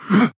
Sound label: Grunt Attack; Mal'Damba; Paladins